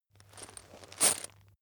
chain mail movement

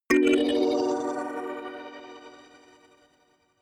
notification kalimba magical minor low

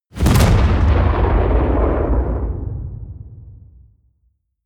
detonation

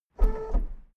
car lift up short heavy

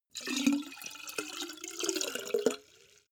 carafe refill

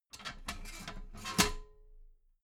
metal ladder small handle fold soft

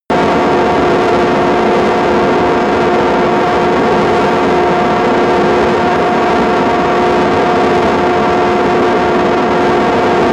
military jet fighter turbine steady full power

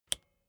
bicycle street brake release

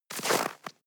step snow walk single

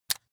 razor rickety fold out

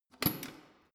letterbox metal unlock

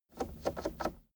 car temperature knob turn short slow

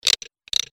tresor door

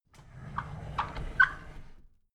drawer big squeak open soft

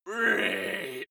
male shout hit

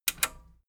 metal button radio click disable fast

